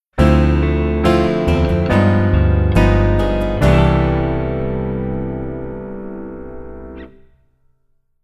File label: Keyboard Voicing Rootless